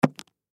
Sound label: Card Stack Set Down, Card Stack Set Down Poker Table; SPORTS; Casinos; SoundEffects-Blastwave